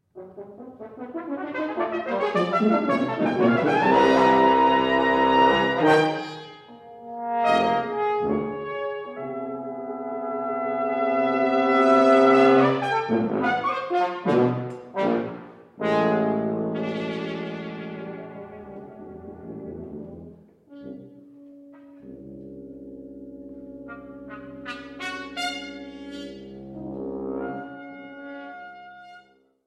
Little Brass Music

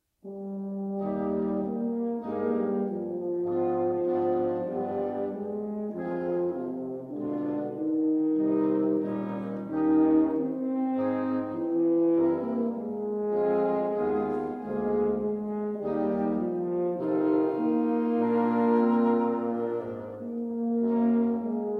Quintet; in G Minor; Mvmt II

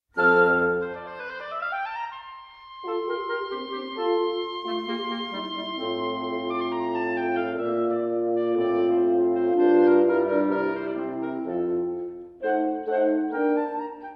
Quintet

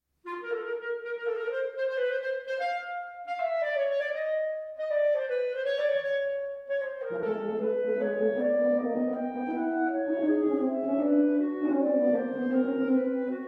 Woodwind Quintet

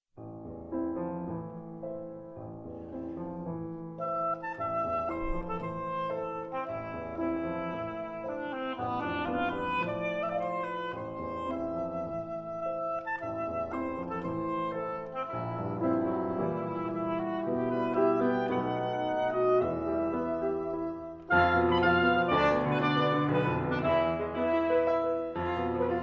Trio in A minor Allegro moderato

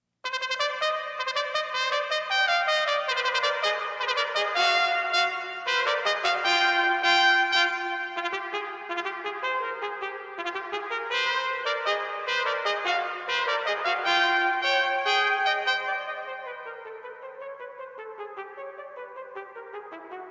Two Trumpets; Fanfare; CRYSTAL RECORDS

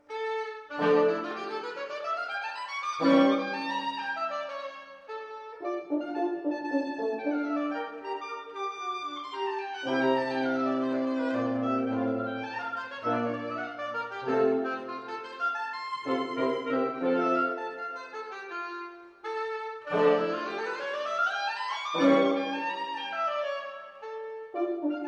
Quintet in A Minor, oboe; op.100, no.5; Mvt 2 Variations

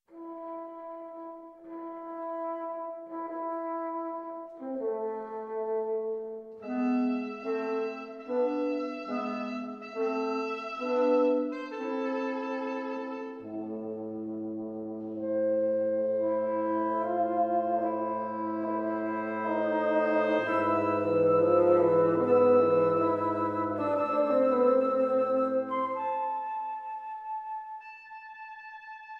Quintet; in A Minor, op.100, no.5, Mvt 1 Lento

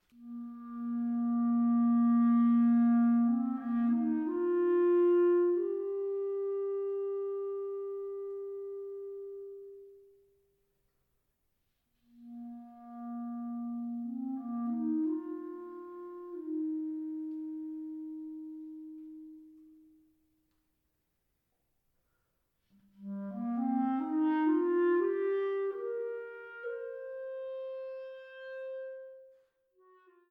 Wind Quintet; Americana Wind Quintet; II. / Hymn to the Dawn; CRYSTAL RECORDS

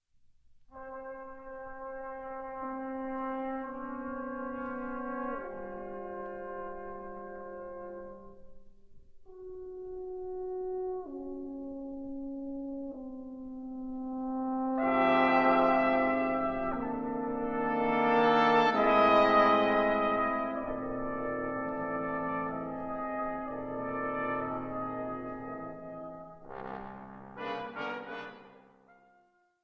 Brass Quintet; Ensembles for Brass Quintet

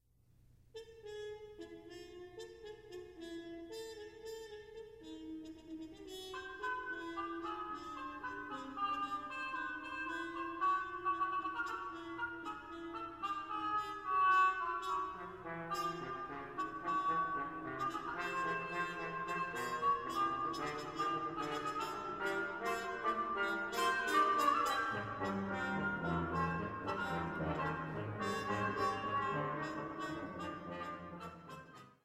Brass Quintet; No. 1; mvt. 2

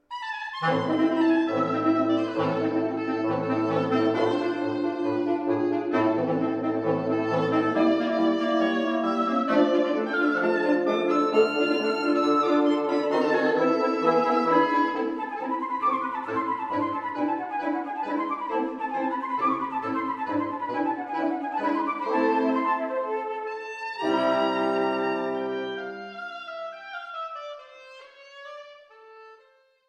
Quintet; in A Minor, op.100, no.5, Mvt 1 Allegro

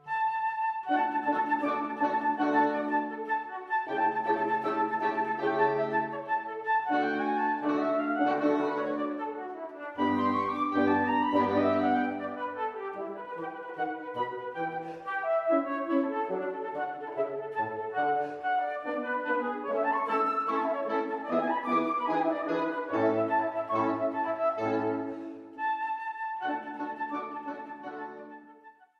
Quintet in A Minor, flute; op.100, no.5; Mvt 2 Variations

